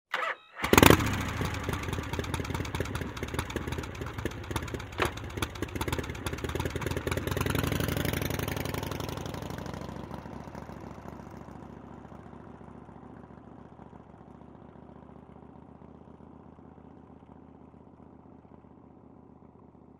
Harley-Davidson motorcycle starts, waits, drives away